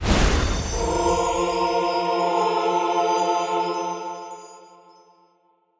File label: Spellcast Healing Magic Choir; Stuart Duffield